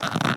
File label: Hub-Train Strap Squeak